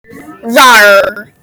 rawrrrrrrrrrrrrrrrr

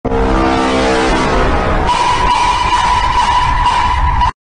sus vaporwave

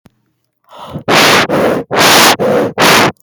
intense breathing

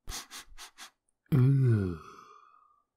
smelly sniff sound

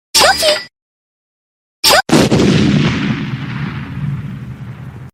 explode

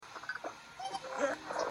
glitch troll

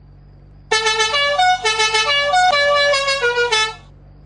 cucaracha horn

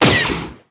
blaster pistol

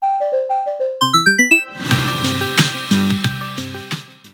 discord lootbox sfx

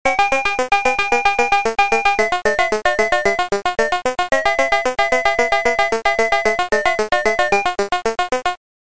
walking; dig dug

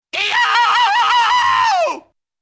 yell; peppino

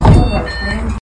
door sound

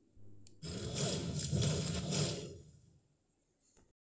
nitro fists punch x3; fortnite

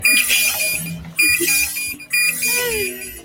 hp critically low; sao

